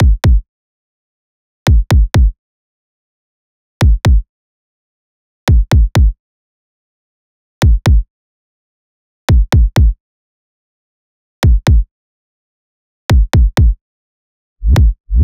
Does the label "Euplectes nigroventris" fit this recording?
No